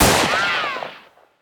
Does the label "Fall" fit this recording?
No